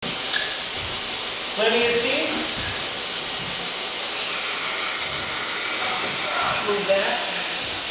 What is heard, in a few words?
Screech